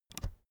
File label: keyboard external space push